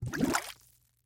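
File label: sounds of a drowning person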